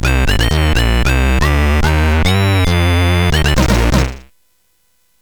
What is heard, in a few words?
Orcus dark lord, CSG8580R5; hvsc